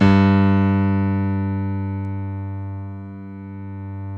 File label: G1 PIA, AC; Best Service Gigapack 1